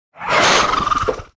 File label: baby hit